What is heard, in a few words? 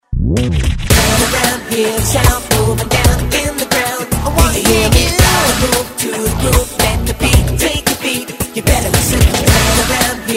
Rhythm Machine